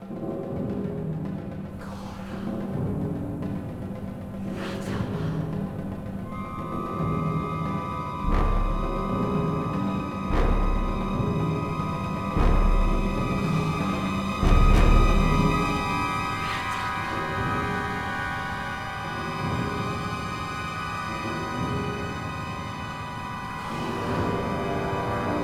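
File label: Sithbattle loop; Star Wars Episode III Revenge of the Sith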